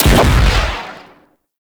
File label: Pistol Explosive Impact; Warframe; Weapons; Sapient Pistol